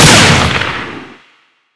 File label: sf smg, weapons